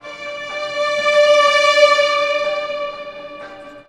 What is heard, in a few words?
TAR STRG -L, Roots Disco; USB Soundscan vol.32; AKAI